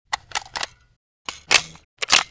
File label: thompson reload, weapons foley; Spearhead Demo; MacFormat 2003 June